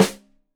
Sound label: Rim Shot Stereo, drums